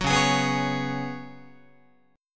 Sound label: Db 7th Suspended 4th Db down Guitar; strummed